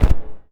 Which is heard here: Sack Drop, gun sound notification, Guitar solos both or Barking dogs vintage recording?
Sack Drop